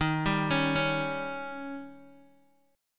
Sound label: Eb 7th Eb, Guitar DADGAD; down